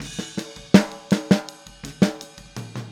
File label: Boogaloo Fill Snare, 82 BPM, Drums; Sonic Foundry (Sony Creative Software) - Drums from the Big Room The Mixes